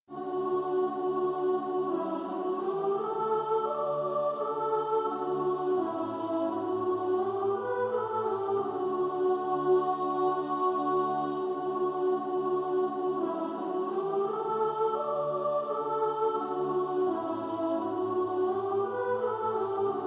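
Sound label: soprano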